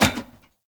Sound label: Heavy Grunt Boot Walk Metal, Footsteps; Characters; Enemy; Sinecure